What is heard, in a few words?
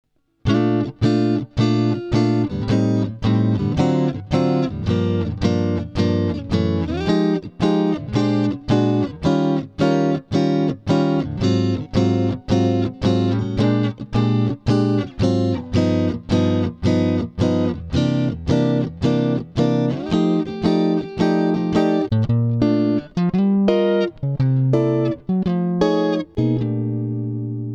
blues jazz